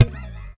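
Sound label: thumb, bass; Xsample VOL-1; SLAP+THUMB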